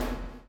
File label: CHAIN; Spectrasonics - Backbeat